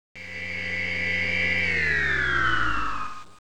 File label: Warp Down